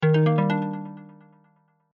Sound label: new android sms ringtone